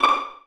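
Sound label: Vio pizzicato D5; Digital Sound Factory Virtuoso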